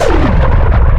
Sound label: ship dst; noiz2sa